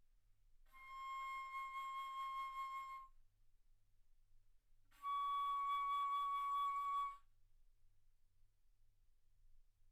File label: Bass Flute ff C6 Db6